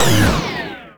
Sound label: goal fail; Tony Hawk's Project 8; XBOX